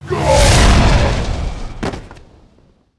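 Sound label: death, monster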